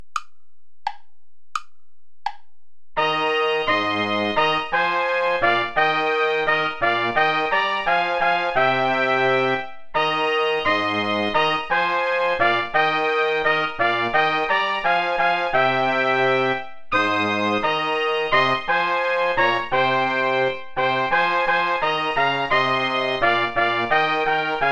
ich will solang ich lebe play along without trombone; schutz heinrich